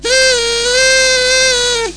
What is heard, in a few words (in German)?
party hupe, comic samples